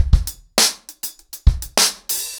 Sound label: 100BPM, ACOUSTIC DRUMS